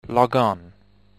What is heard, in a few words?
logon